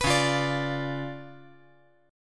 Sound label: C sharp 7th Flat 9th, chord; C#7b9; strummed; JGuitar